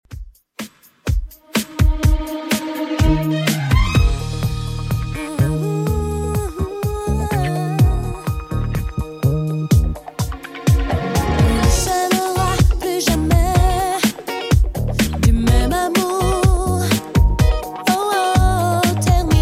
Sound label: 90s Redrum 125bpm